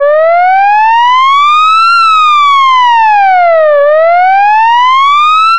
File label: short alert siren